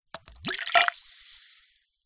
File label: food drink soda beer pour small amount glass fizz